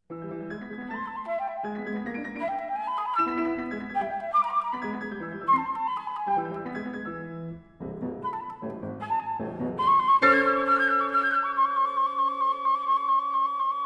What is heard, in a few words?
Sonata for flute and piano - Quarter note = 132; 1922